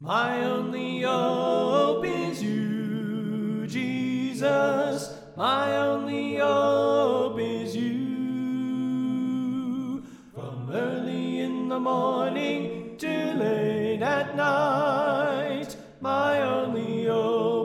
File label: Tenor Left